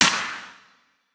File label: Snare, Dubstep Snares; GhostHack